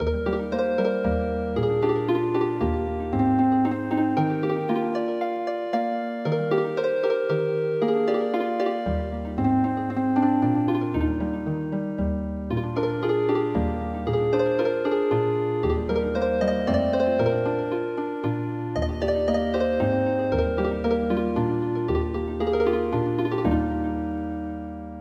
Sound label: Healing Harp, traditional